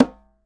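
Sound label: Conga Hi Mute; Roland BK-5; Percussion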